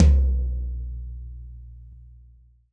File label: TD-20 Tom B, Roland TD-20 Toms